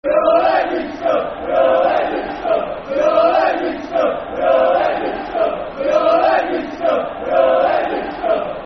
We All Hate Leeds Scum Football Chant (Soccer Song); Walsall Wfc; fanchants